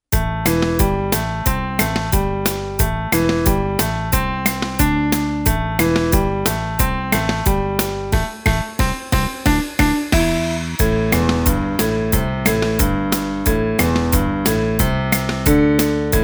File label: Bass Riff